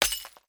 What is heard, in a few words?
Glass; Blockman Go; Android